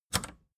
record player power click on fast

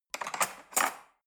cutlery fork drawer handle scrape soft

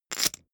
poker chip stack big rattle short

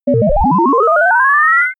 telegraphic synth

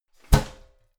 garbage can close lid hard damped

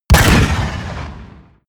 ballista fire hard

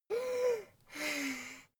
child breathe deep

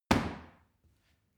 paw kick medium

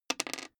die roll table hard

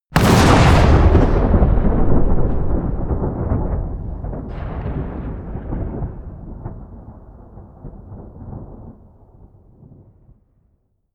generic thunder oneshot close

